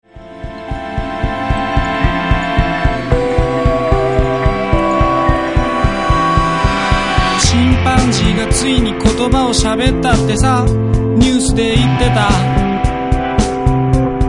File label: chimpan